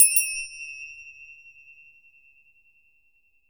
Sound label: KETJER, ETH PERC; WorldSounds